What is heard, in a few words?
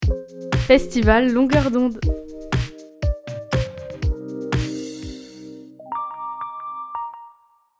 jingle